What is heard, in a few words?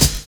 PULSE; Univers Sons